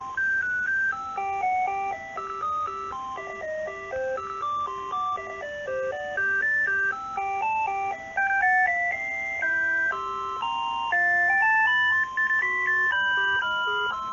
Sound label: ringtone